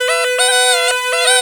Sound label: PUNGI; USB Soundscan vol.45 - Indian Traditions; AKAI